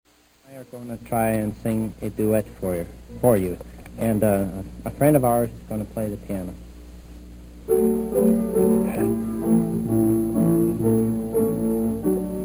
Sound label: Gloria Duet